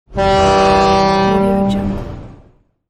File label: ship foghorn; mattpear